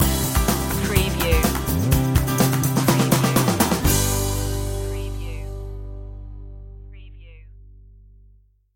bright journey stinger

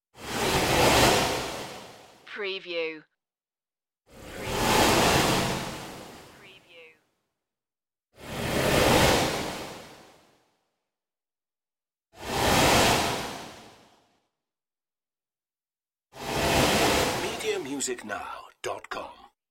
floating wind whooshes

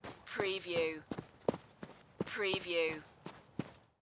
Footstep Snow Run

